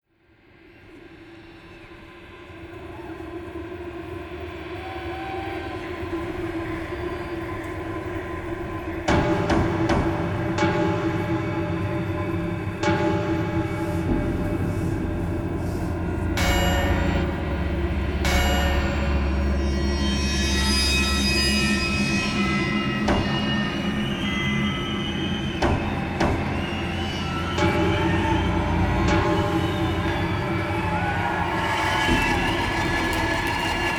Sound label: Noise